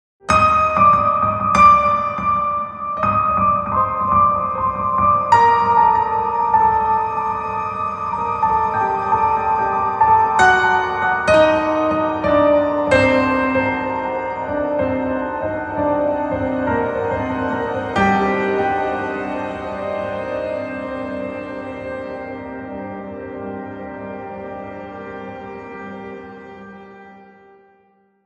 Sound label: Surdina Ambience; To Trips; Gratomic